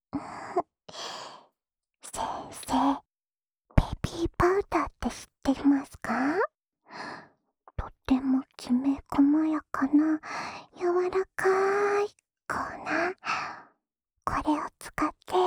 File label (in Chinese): 安眠用 宝宝爽身粉按摩（左耳）, ASMR